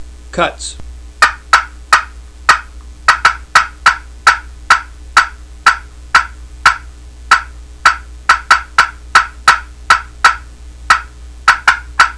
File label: glass cutts